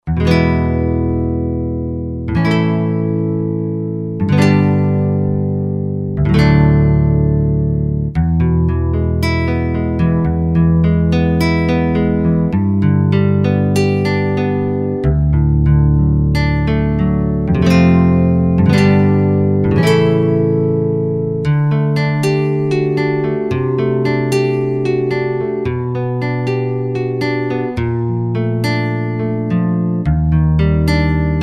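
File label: Nylon Guitar; Roland JV-2080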